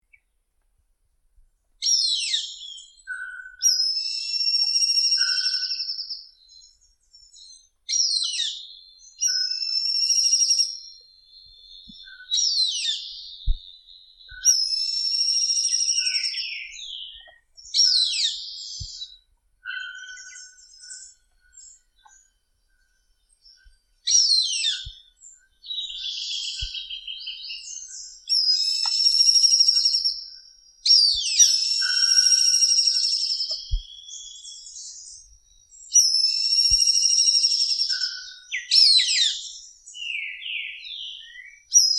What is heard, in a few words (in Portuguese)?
Canto do Gaviao de sobre branco